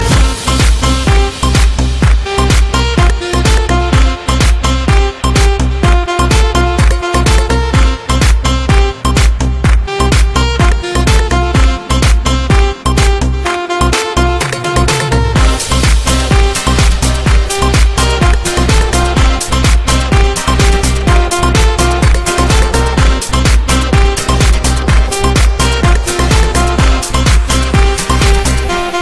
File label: House Music